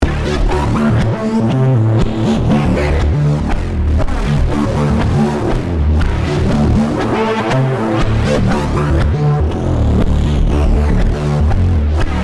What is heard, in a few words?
1osc